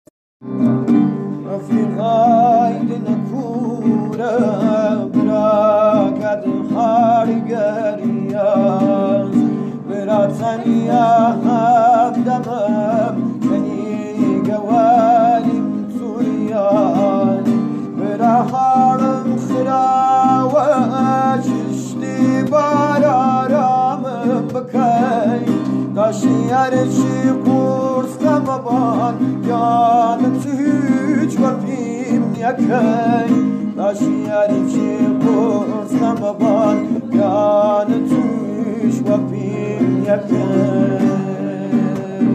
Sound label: kordi ba gitar; kordi ghmgin